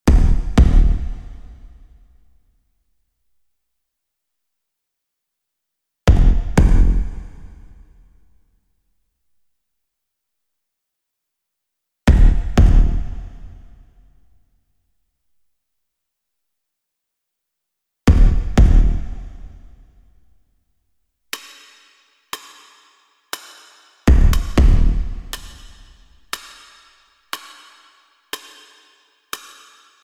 phantom forest, off melody

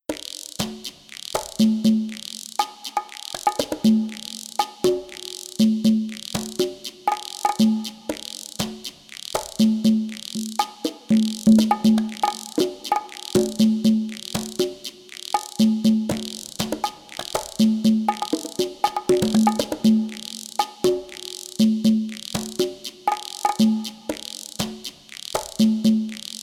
only bass

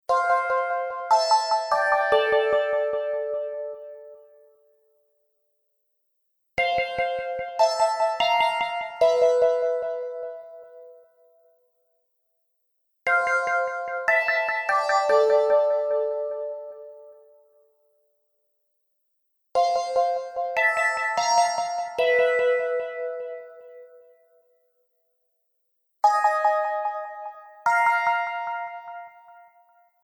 only melody

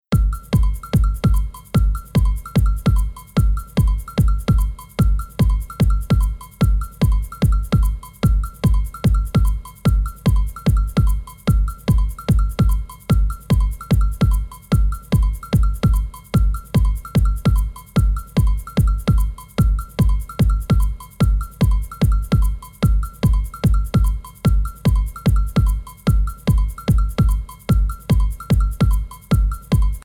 only bass

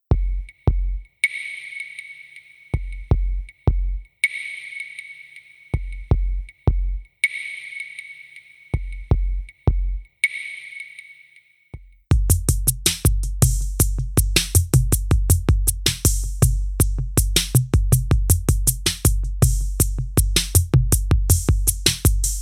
only bass

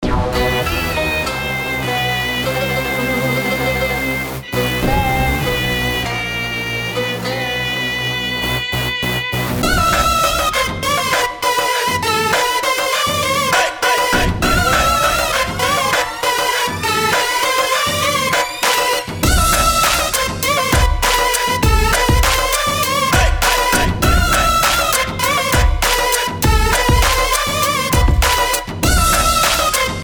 Oriental Beat

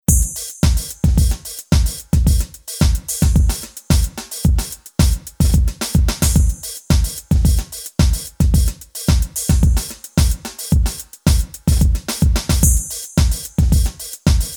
only bass

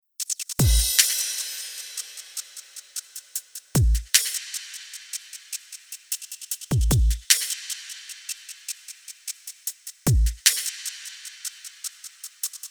only bass

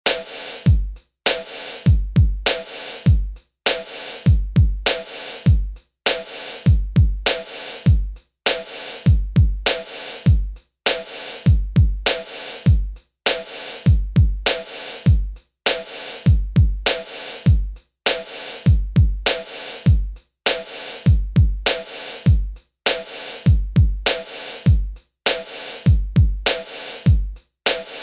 only bass

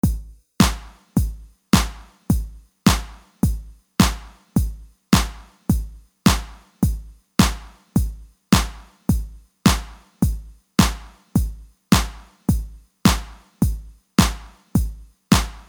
Only Bass, funk

